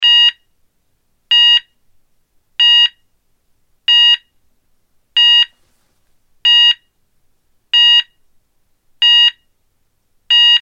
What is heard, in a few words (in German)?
Ton